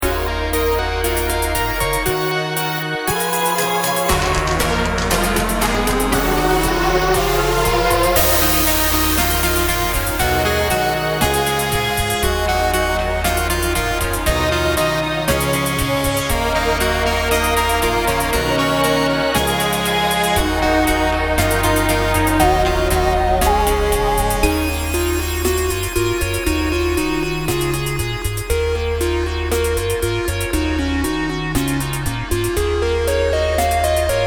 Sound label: to be continued orchestral, An orchestral version of a melody